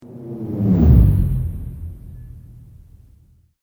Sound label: Sweep Organic Slow